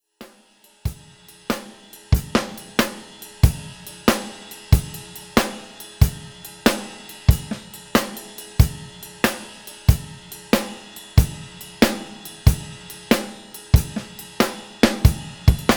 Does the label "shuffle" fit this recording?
Yes